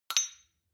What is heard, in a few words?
shot glass clink double; soundpool